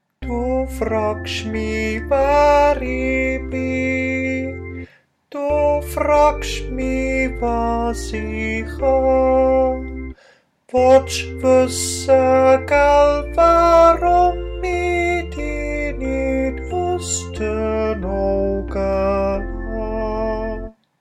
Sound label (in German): tenor